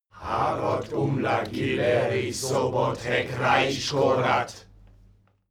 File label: male crowd evoke